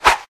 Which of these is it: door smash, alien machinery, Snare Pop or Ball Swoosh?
Ball Swoosh